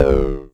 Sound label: Klone Dual Percussion Synthesiser tom; KLONE FX NW8